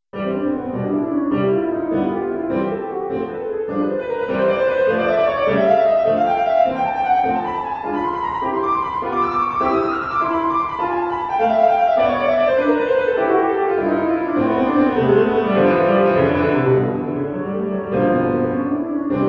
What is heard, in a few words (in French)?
etude velocite piano